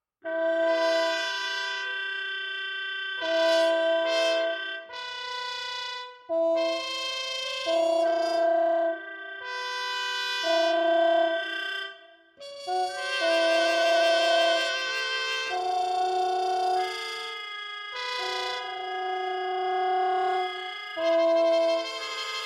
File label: adagio; trumpets; fanfanfaren xii; Andy Kozar